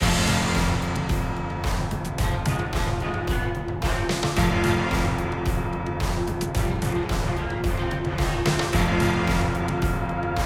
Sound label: bgm battle; sword of the necromancer